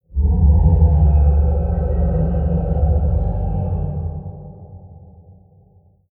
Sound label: Deep Monster Growl Cave Ominous Moan Evil Alien; Tom Hutchings